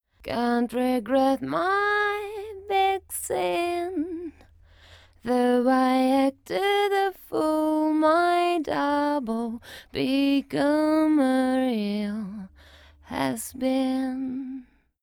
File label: solo vocal